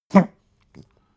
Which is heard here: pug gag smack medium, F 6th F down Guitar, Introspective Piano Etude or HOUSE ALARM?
pug gag smack medium